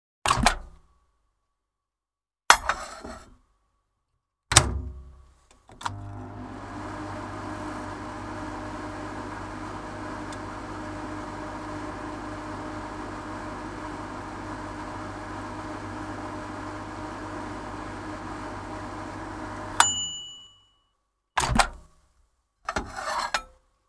mikroaaltouuni

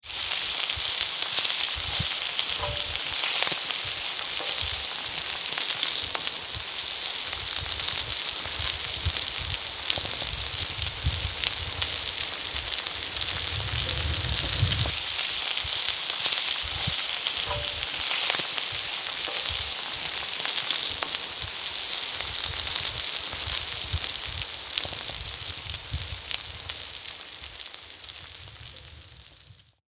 Ruoan paisto

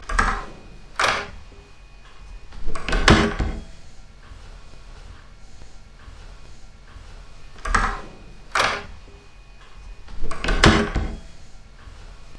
ovi komero

